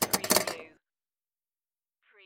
Pinball sound, ball hits three kickers

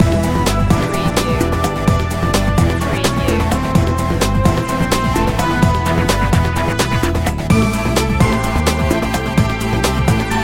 secret agent loop

